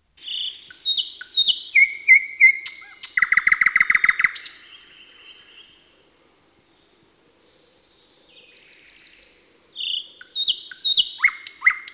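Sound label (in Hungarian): Nagy fülemüle, Madarak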